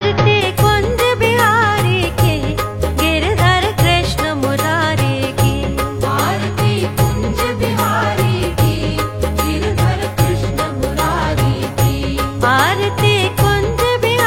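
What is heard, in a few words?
Krishna Aarti, Bhakti; Aarti Kunj Bihari Ki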